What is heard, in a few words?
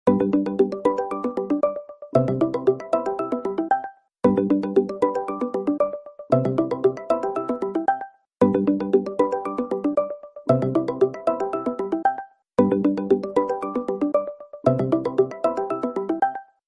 notification sound; google duo